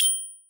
metallicheskij zvon

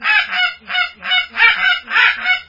pronzitelnij krik golodnogo gusya

